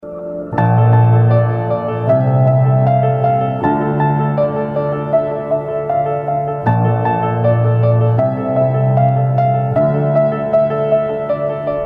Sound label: Piano